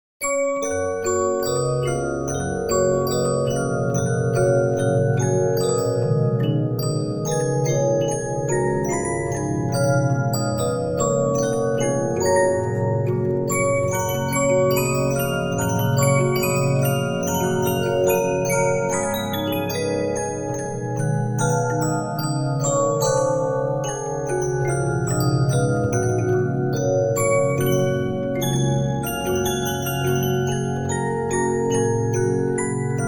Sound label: Regina, 15inch disc musicbox